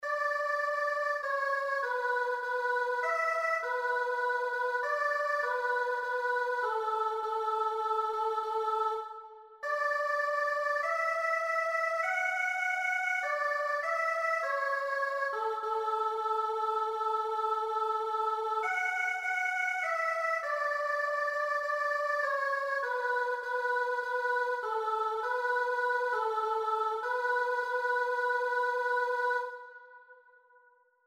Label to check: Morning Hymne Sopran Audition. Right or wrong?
Right